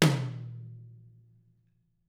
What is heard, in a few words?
Drums HIT; ILIO; Double Platinum Drums 1